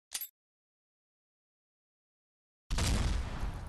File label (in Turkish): silah sesleri efekti